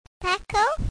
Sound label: backhoe